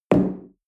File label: espresso place tablecloth